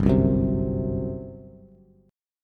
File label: G Major 7th Suspended 4th, Bass; strummed